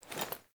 Foley Action Default Melee Swipe, Foley Default Clothing; Characters; Player